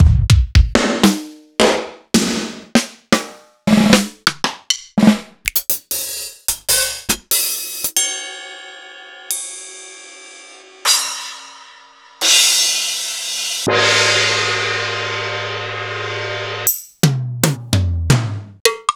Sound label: Acoustic; proteusvx